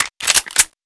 clip in, weapons; paranoia; aps

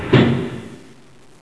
small lift stop

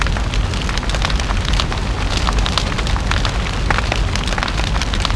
door fire

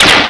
teleport in

